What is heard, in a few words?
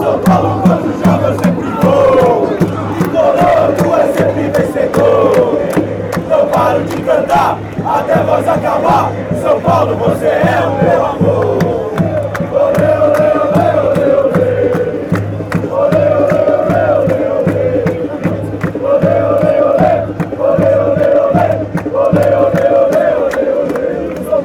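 sao paulo chant, football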